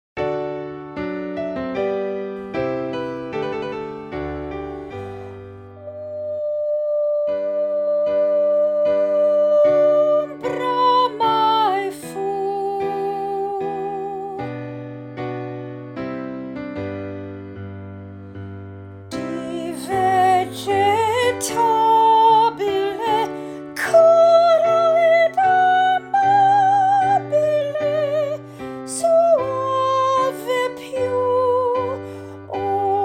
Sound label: Ombra Mai Fu Vocal Demonstration; North Carolina Elementary Honors Chorus